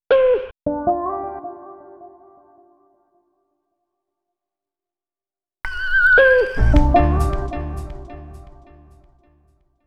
Melody, Hip-Hop

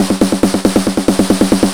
snare roll 138, snare-rolls, Techno; Techno ID; drum-loops

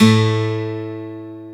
12STR, AC.GTRS

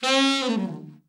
ALT FALL, ALT-SAX FALL; Giga Samples Collection

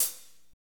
hi-hat, Slow Shuffle; Northstar; Drumscapes Roland

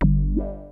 TECHNO BASS; Kid Nepro; Elite

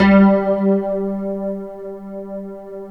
PULS HARP G2; Big Fish Audio; Synth City

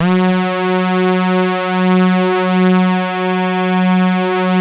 F#, GLIDE, Synth; InVision Interactive; Keith Emerson; The Most Dangerous Synth and Organ

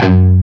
CHUG F#1 L, Guitar; East West; Steve Stevens Guitar Samples Collection

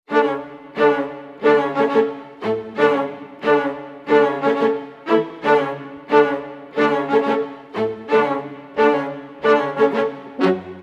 F#min full mix, Orchestral arrangements

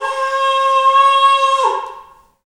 Bullhorn, Afro Horns, Africa

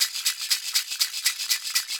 120 SHK

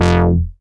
bass F1, EMS VCS3

